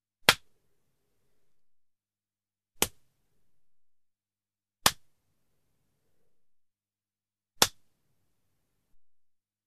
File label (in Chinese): 煽嘴巴